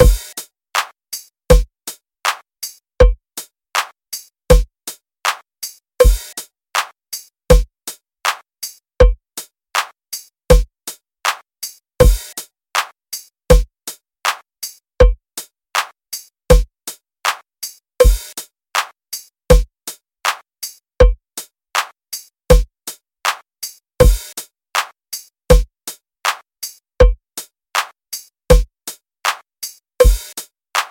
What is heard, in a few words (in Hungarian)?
dob loop 50bpm, tanítás